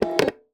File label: Single Shunt, DVD Drive External; DVD Drives